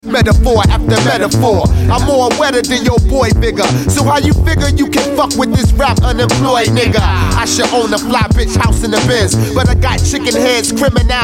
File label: rap after